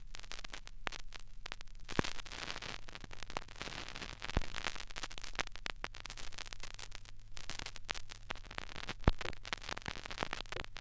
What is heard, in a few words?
crackles, Vinyl